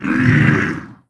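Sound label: iron giant hit; sinfar haks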